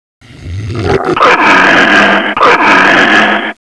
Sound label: roar angry; rheptor